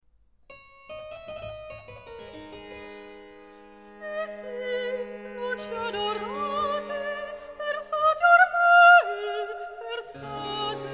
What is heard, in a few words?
Cantata for Soprano solo, Recitat; Recitativo; Antonio Lucio Vivaldi; Geme londo che parte; RV 666